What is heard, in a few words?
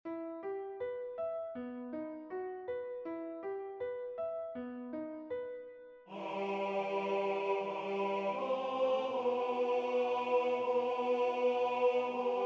tenor